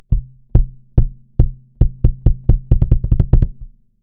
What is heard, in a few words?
Sub Bass 808 kick bypass; SubBass Doctor